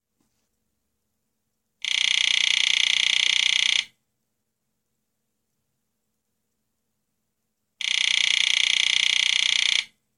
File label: Mobile hEar silent; Opis-60